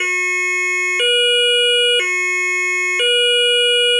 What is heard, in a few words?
Sweden Universal Alarm; Tonfolgen Hella; Dachbalkensystem RTK 7 Konfigurator